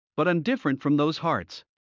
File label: Heart; Classroom humanbody